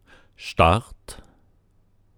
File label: Start